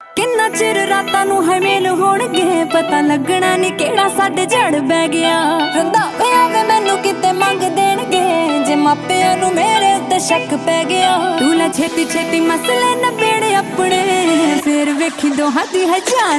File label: Dance Punjabi Ringtone